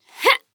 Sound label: Grunt Jump; Paladins; Maeve